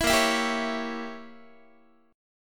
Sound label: A sharp Diminished 7th Guitar; strummed; JGuitar